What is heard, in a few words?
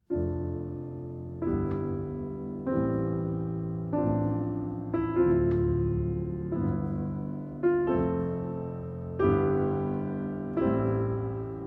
Preludes in C Major